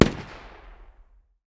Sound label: ak 762 medium distance; tacz-tweak-pack; taczmechanics